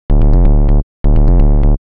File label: techno short mid bass; instruments; loops